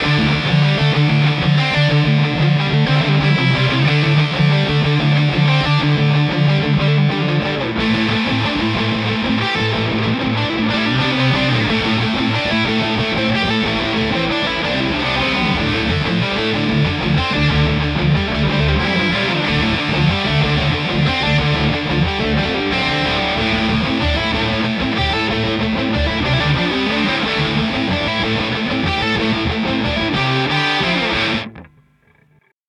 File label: Pro Mod High Gain Bridge; Charvel Joe Duplantier